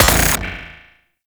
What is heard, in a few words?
Shot B, Charge Gun; Warframe; Weapons; Corpus; Crp Charge Gun; Cycron